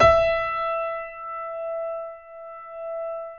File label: E 4, Keyboards Acoustic, GRANDP ST; USB Soundscan vol.17